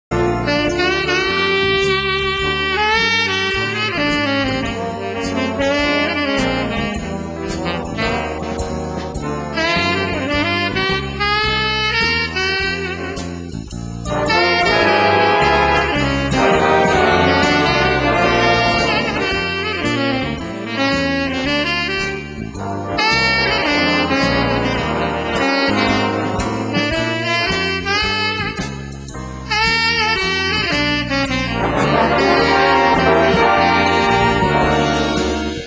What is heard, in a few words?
Tenor